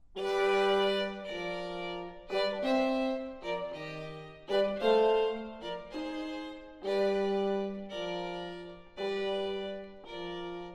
Twilight Music For Horn, Violin and Piano - Antiphon; Tempo giusto